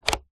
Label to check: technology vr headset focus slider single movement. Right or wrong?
Right